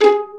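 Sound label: pizz violins; Proteus2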